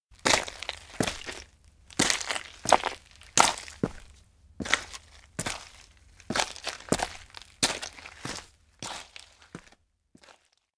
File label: steps outdoor mountain road climb landslide; fumiya; anemoi